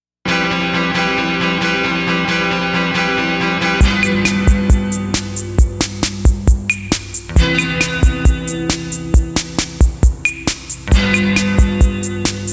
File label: Instrumental